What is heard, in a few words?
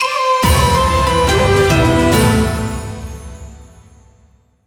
jingle equipment enhancement success; sengoku taiga android ios mobile gamerip 2018